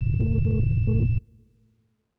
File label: low drone; ゆめ2っき